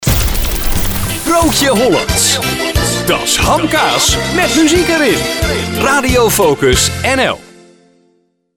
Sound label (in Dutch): Broodje Hollands Volendam Tune